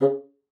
bassoon, C#3; Xsample VOL-6

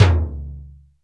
open drum, Roland R-8

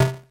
Snare, Korg DS-10

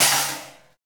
lid, Trash Tuned; Roland L-CD701; Trash+Kitch

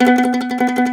MAND TRM, Mandolin

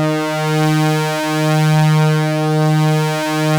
OB D#4 -L, Rare Synthesizer; Hollywood Edge - Giorgio Moroder Rare Synthesizer Collection; OB 4 VOX.

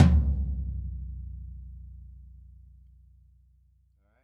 DW Red Maple Tom Room; Sonic Foundry (Sony Creative Software); Vital Drums

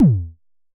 Unce Unce Kick E2, Vermona Kick Lancet; LookIMadeAThing Kicks

